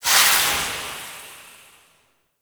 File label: Projectile Death Small; Weapons; Warframe; Duviri; Incarnon Adapters